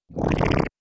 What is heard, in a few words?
Slime gun